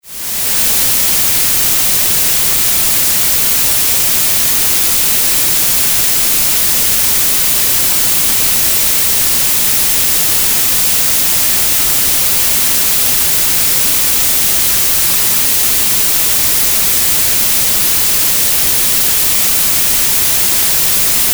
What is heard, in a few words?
white noise; sound oasis